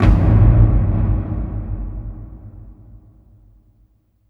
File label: BIG BOOMAB, AMBIENT DRMS; Spectrasonic Distorted Reality 1